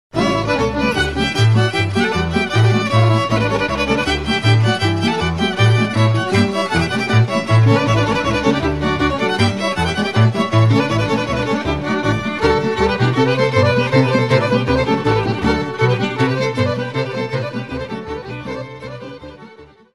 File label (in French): Zurka, Serbie